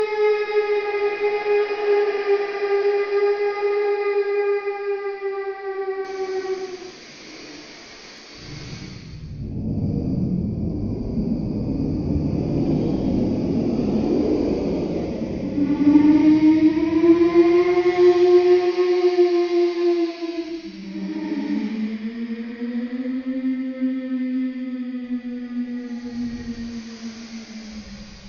Smiler Ambience; backrooms 2d android ios online windows gamerip

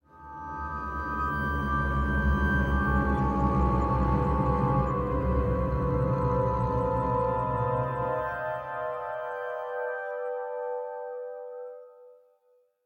sting Sad; the walking dead michonne 1 in too deep

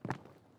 Step

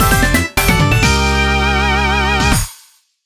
Race Start Fanfare; Mario Kart 7; (Grand Prix) ~Download Play~

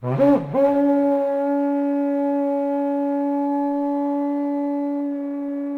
Kudu Horn; Civilization Revolution

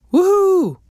Emote Male Happy; Idle Champions of the Forgotten Realms

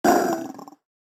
trident pain

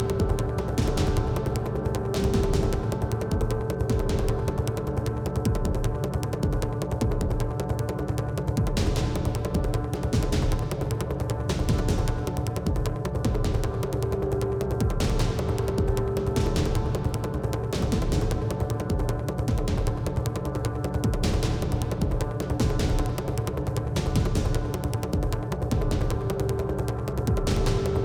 Holy Drums Fight; gamerip; Battlefield 4

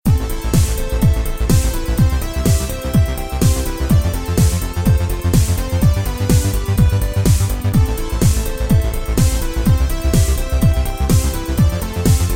techno

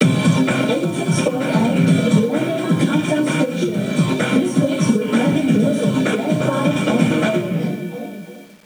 bgm inside karaoke; yakuza ryu ga gotoku kiwami 2 pc gamerip

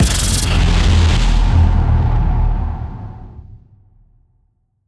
Maze Spider; Devour; The Inn

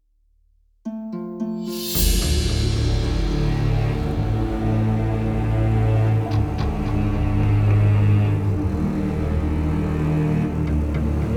Monster Hunting; Skellige; Monster Hunting 01-1

